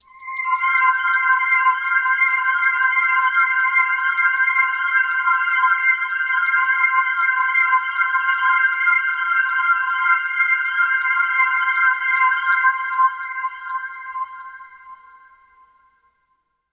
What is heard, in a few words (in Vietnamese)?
Am thanh tuong tuong hien ra trong suy nghi